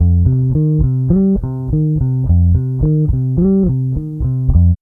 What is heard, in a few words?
BASS, Funky Ass Loops; East West